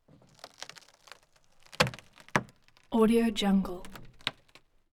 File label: wood splinter